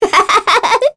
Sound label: Laugh, kr; Sonia; Kings Raid